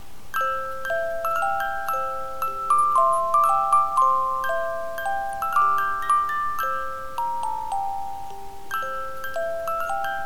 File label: Music Box